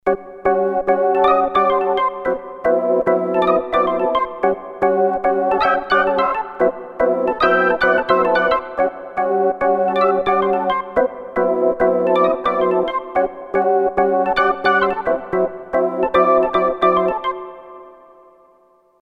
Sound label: farifisa, roland mt 32